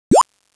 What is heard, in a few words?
CHIRP, Sea Sounds